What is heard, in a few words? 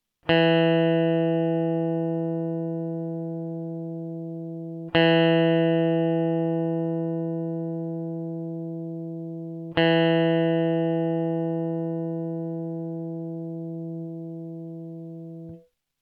open e e